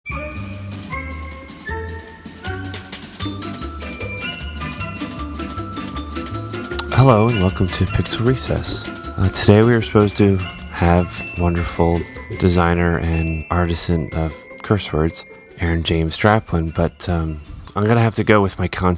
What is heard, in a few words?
delay